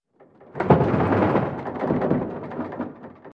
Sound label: ac130 rattles; amb elements; modern warfare 2